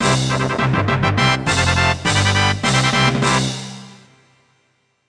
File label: Collect all Jiggies